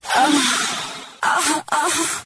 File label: female orgasm